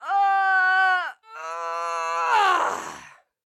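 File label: female plaintive moan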